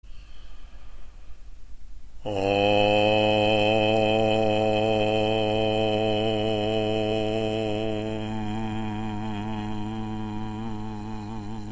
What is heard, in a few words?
mantra OM